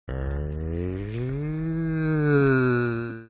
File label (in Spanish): Grito Sordo; Pokemon Essentials; Pokemon Circon BETA 2 v1.8